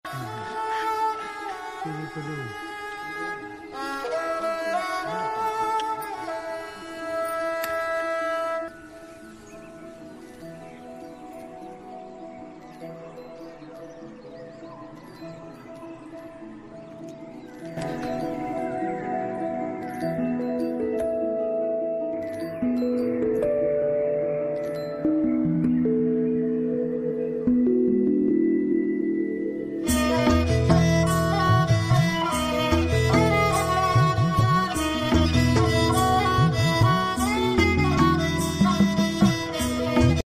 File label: marathi song ringtone